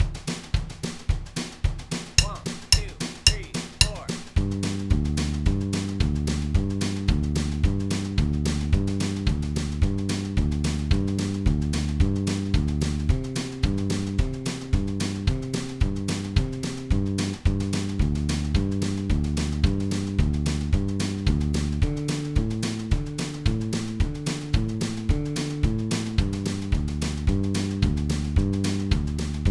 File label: best double stop lick, backing tracks; chicken pickin